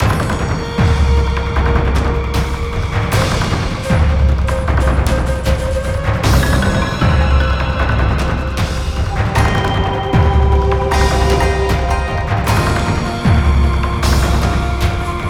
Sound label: BGM Loop; hidden dragon legend